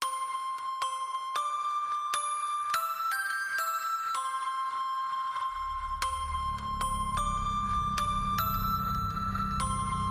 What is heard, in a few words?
Pop Goes The Weasel Horror Inst